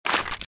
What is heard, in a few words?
draw, 9mm handgun